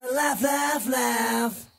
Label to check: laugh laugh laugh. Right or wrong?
Right